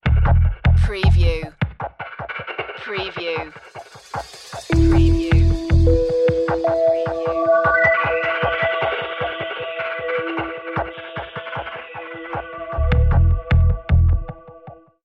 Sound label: investigating the crime sting